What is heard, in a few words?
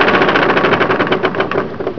pulley, machines